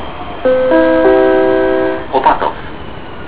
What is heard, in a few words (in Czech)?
prijezd, metro; Opatov